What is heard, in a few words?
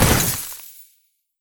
Impact Ice; Impact Ice2